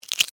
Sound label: Insect Claw Arm, Animal, Insect, Claw, Arm, Reach, Snap, Movement, Bug